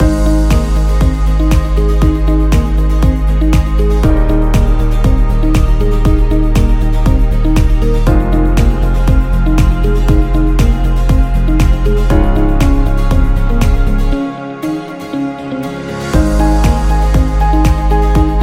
Upbeat Corporate Loop; explainer

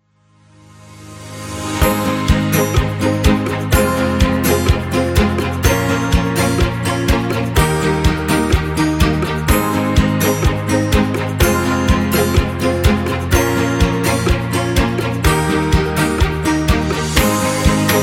Upbeat Ad

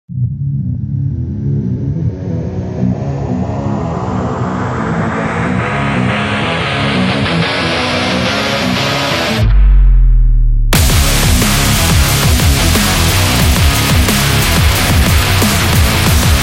Metallic Epic; sports training energy

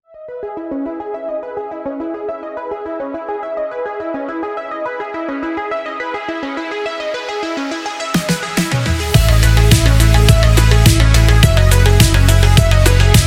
Retrowave; synthwave

